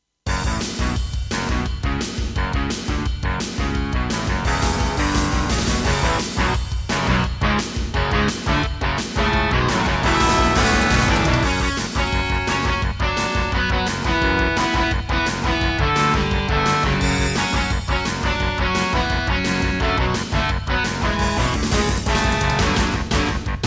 Intro, Roland SD-50 GS MODE; Duke Nukem 3D

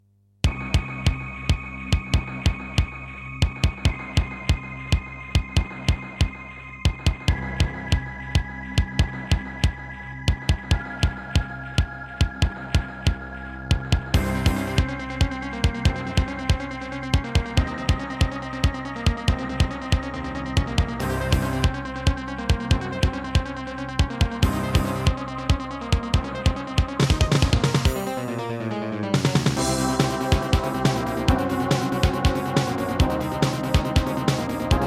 Diamond Monster Sound 2MB; sigil doom episode 5

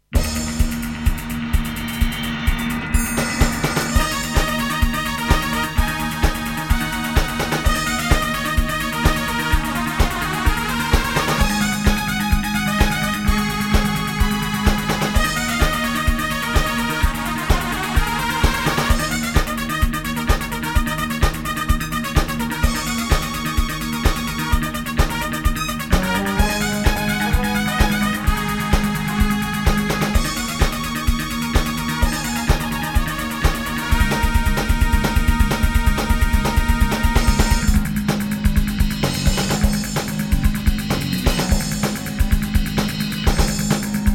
EMU Sound Engine; Wacky Wheels

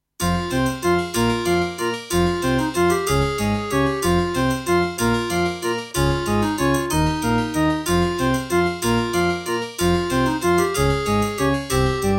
Doepfer GMX-1; Death Gate - Track 6

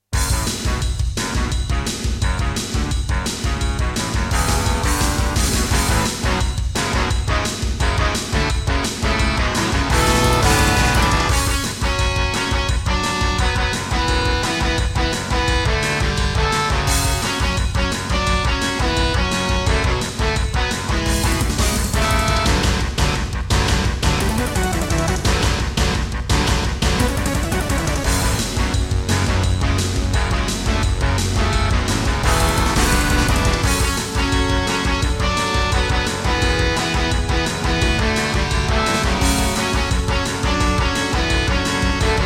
Intro [131MB Soundfont]; Duke Nukem 3D; EmperorGrieferus

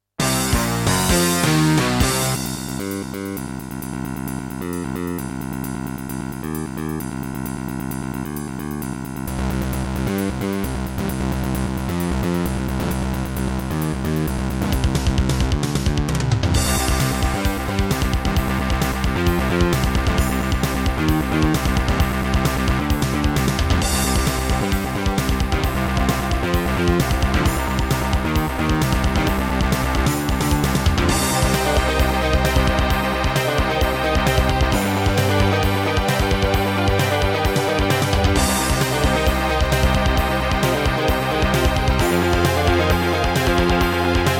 Roland SC-88 55MAP; sigil doom episode 5

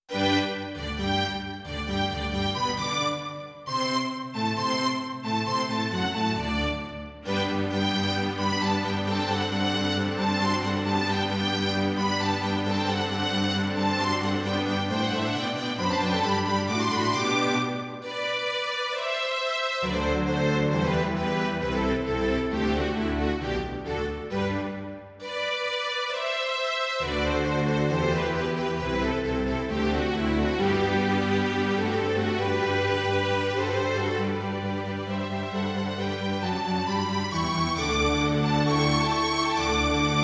Roland JV-1080; classical; Mozart; Eine Kleine Nachtmusik partial

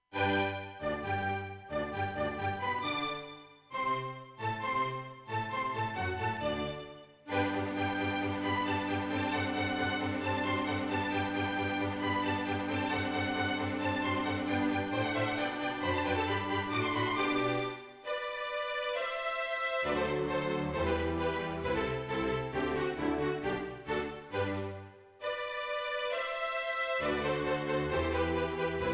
Serdaco X3MB Buran; Mozart - Eine Kleine Nachtmusik partial; classical

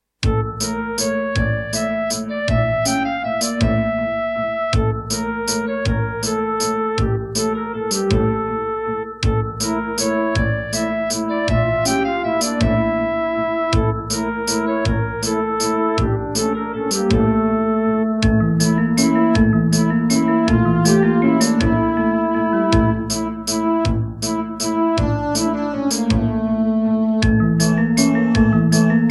Serdaco Crystal Blaster C1

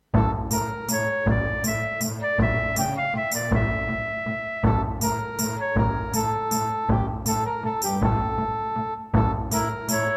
Hammond GM-1000

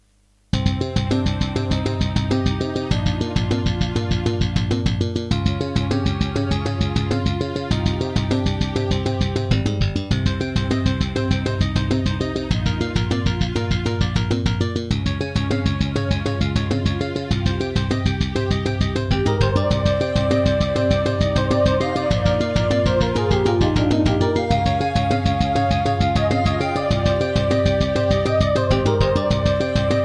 Serdaco McQub; Introduction; Hocus Pocus

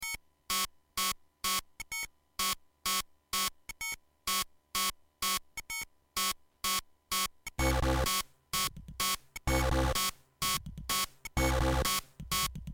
Creative SB 2.0; System Shock

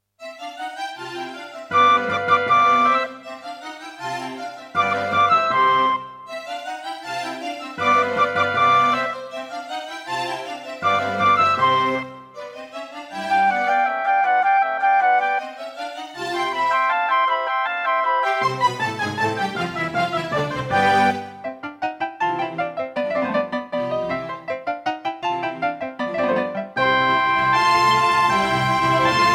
Roland SC-88; 55MAP; classical; Mozart; Concerto K467 partial

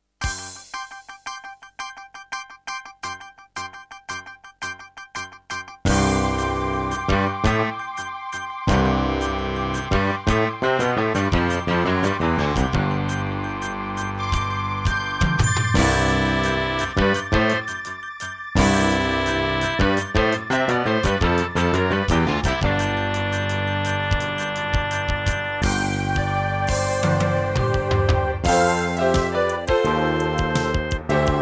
Terratec MiniWaveSystem SOWT-11

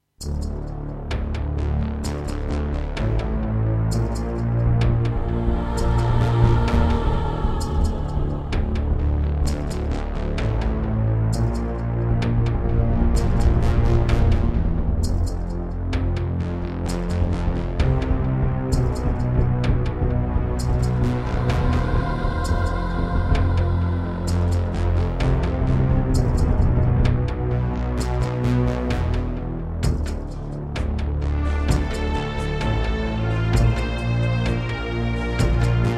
Trust Korg Super Sound Module; Descent II

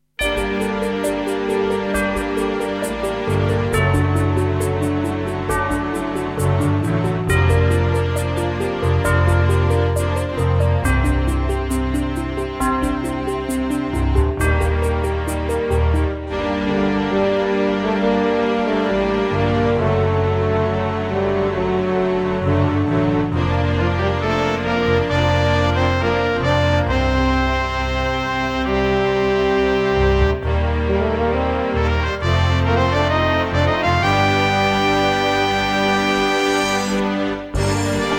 Intro EMU SoundEngine; Quest for Glory 4; QFG4

